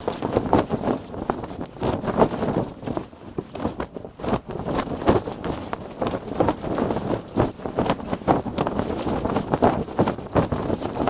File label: Flag Flap loop